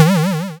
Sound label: Odd bounce